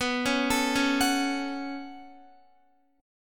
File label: B 7th Suspended 2nd, Guitar; B7sus2; arpeggiated